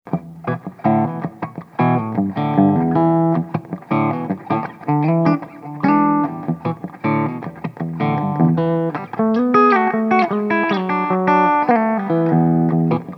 Harley Benton Mighty-5th test strat steg clean

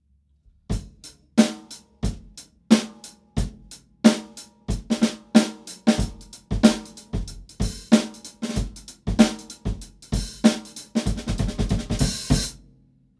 beyerdynamic tg d71c ganzes set 2m vor 22er bassdrum 14er snare bearbeitet

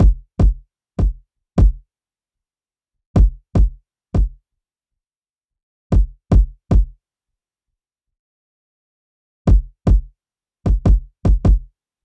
kick vorher; EQ Tipps für Beats; 5 EQ Tipps für Beats

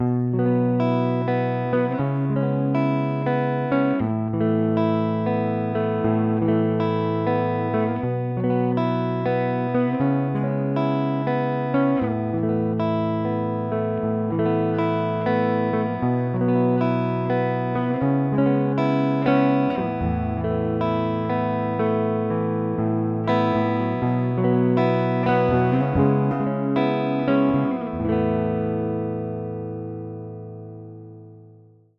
kammfilter dry

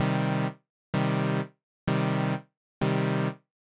Subtraktive Synthese Wellenform; bitwig

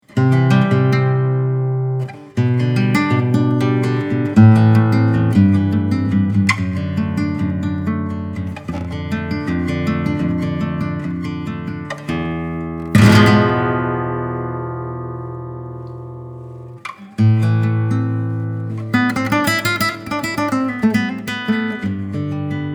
la mancha rubinito lsm 59 klangbeispiel flamenco, Klassikgitarre

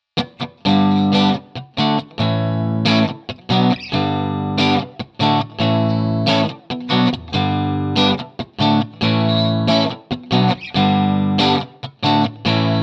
clean chords steg split, gibson les paul standard

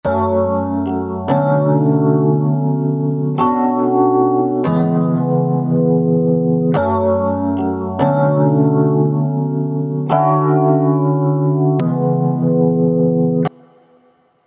akkorde; Lo-Fi Hip Hop; 5 Tipps für Lo-Fi Hip Hop Produktion

